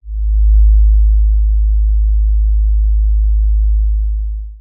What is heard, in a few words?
sub bass short tone; sound design; zapsplat